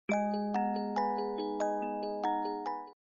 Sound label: Chime